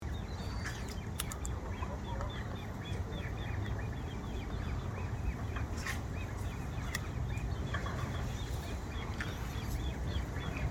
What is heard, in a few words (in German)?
haubentaucher mit nachwuchs